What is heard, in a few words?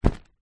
cool bag fabric set down